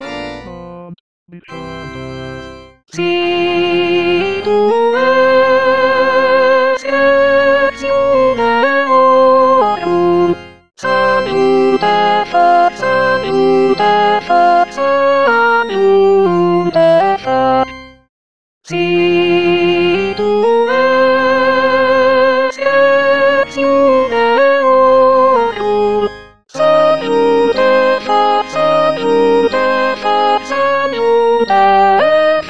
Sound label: sopranes